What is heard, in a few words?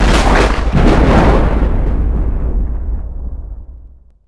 thunder